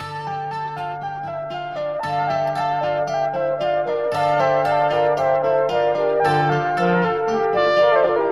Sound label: guitar flute keys, Loops, Sad